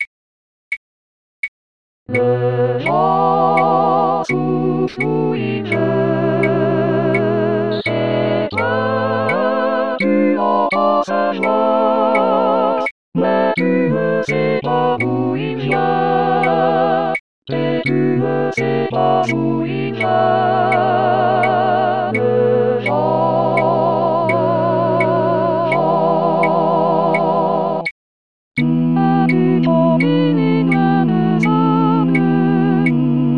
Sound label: Tutti